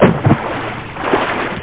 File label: Water Splash; Education Publishing Concepts